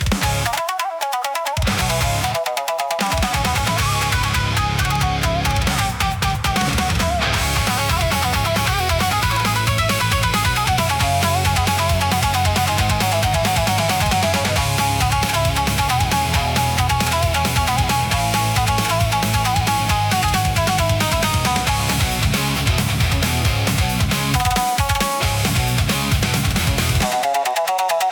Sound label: intense japanese rock